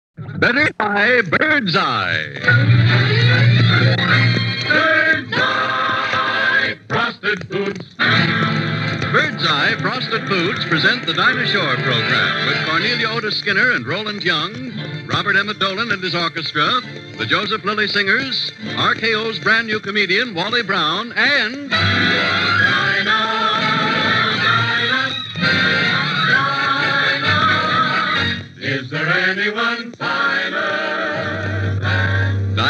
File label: 1st Song; Birds Eye Open House